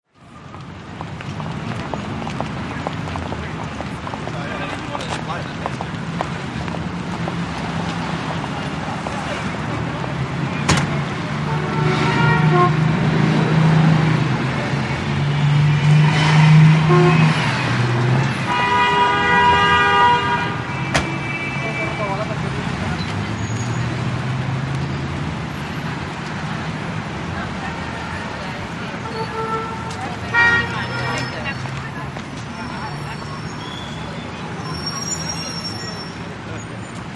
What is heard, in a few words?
NYC Rush Hour, big city